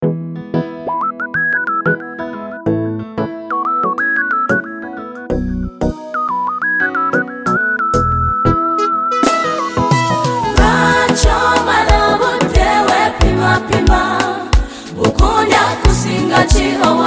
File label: Mwoyo Gwange, Choir; Streams of Life Choir Kennedy Sec School